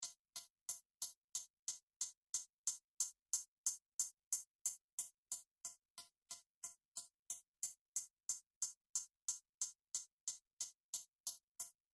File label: HiHat